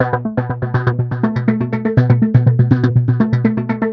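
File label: 122 BPM Sequence C major A minor Tonic A; innovative sequences 1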